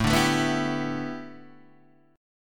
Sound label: A 9th Suspended 4th Guitar Open D, chord